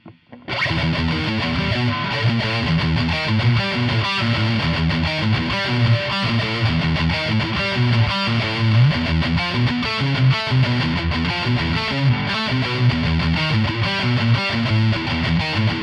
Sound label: riff